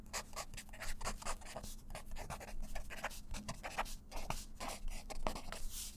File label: Fine Felt Writing, Books, Paper, Writing